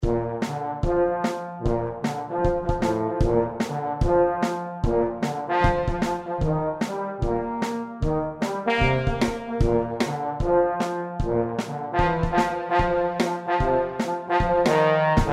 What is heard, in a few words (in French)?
trombone tuba batterie